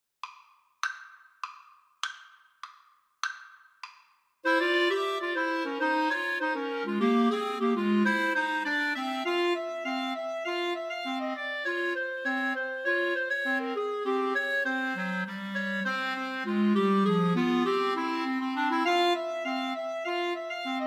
Clarinet Trio

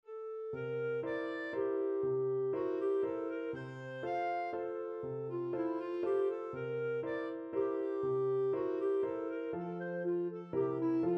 clarinet; schubert german dance No2 D420

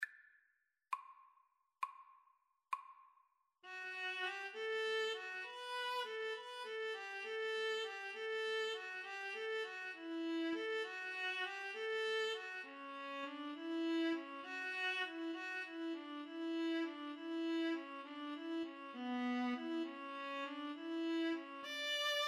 Viola Duet

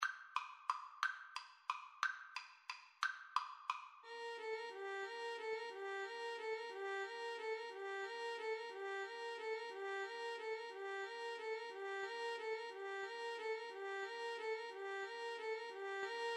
carol of the bells, Violin Cello Duet

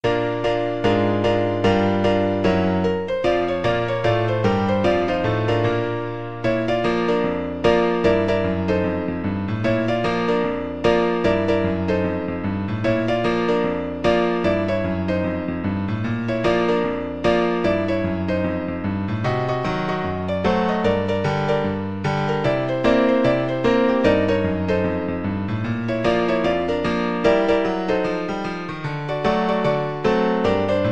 western blues, piano

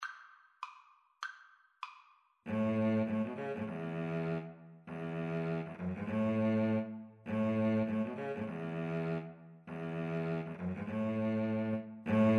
midnight dance, Viola-Cello Duet

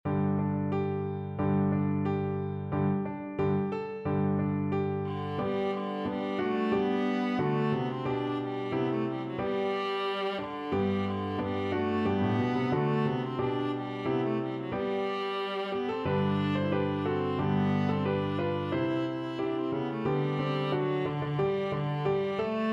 viola

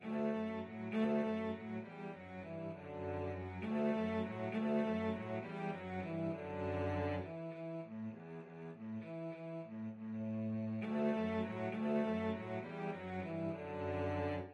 moaner cello duet

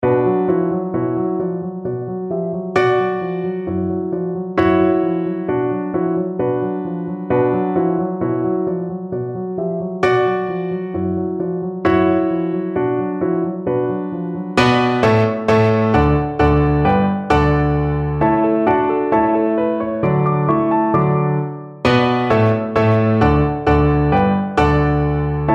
schubert escossaises, piano

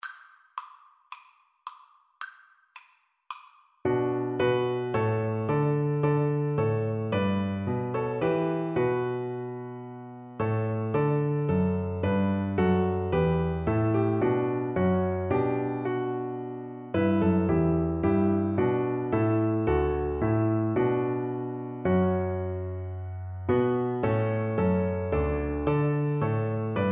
emmanuel, Double Bass

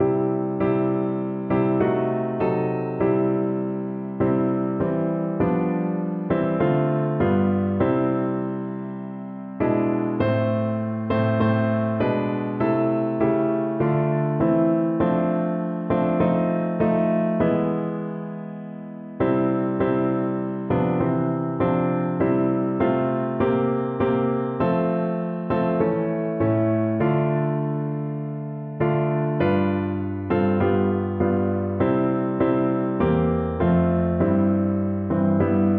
PNO

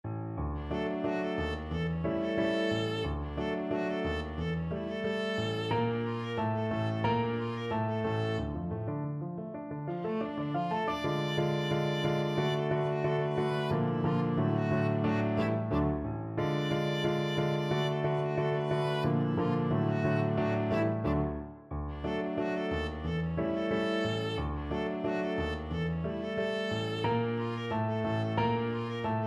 viola

